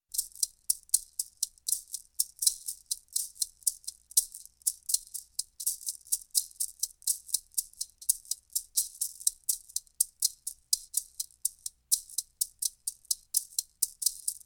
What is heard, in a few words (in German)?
maracas rhytmus; Suedsee